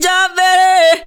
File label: VOCAL LINES; Best Service; World Colours